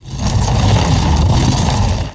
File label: Loop For Big Drill; Playable Tutorial Level; TR3